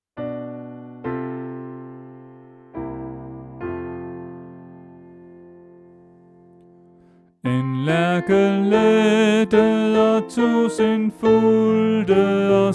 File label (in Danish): Sopran